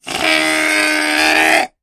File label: Air Release Funny